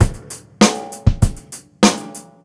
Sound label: BEAT R, Roots Funk; USB Soundscan vol.41 - Roots Funk; AKAI